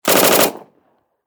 Warfare Submachine Gun Thompson 9mm Short Burst Distant Perspective; Machine Guns